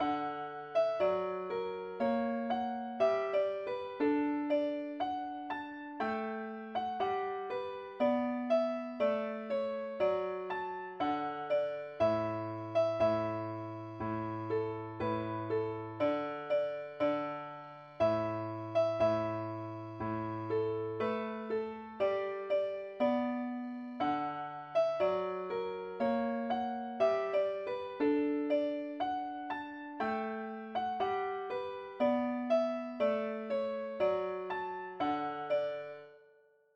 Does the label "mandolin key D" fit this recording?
Yes